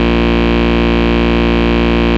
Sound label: Root A#, Ultimate Bass; Vengeance Samples